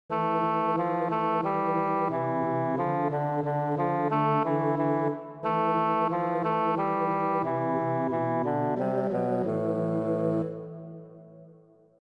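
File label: Shanty Chorus Instrumental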